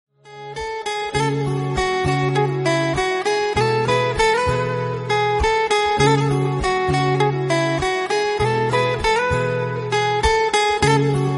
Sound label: guitar ringtone